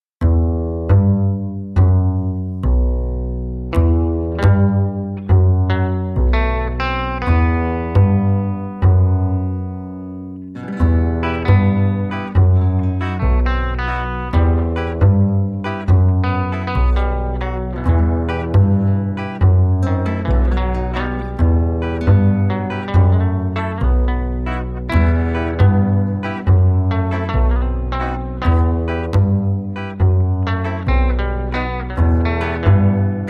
No Percussion

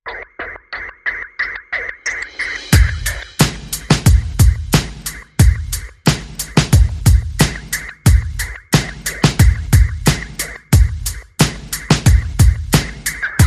Perc and FX

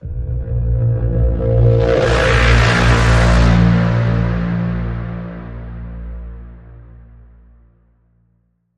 Bend, A sharp

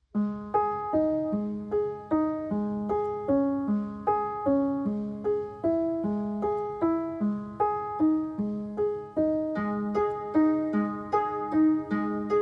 No Topline Pianos and Harp Mix

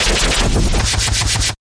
Swishy Bump Burst

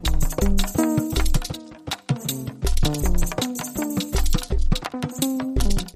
Link Sting

